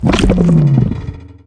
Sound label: Thud